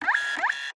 Printer Printing Individual Cycle Servo Motor Toner; MKH8060; PMSFX